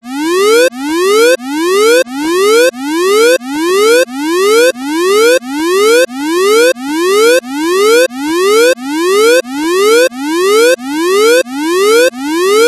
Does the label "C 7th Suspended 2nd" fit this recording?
No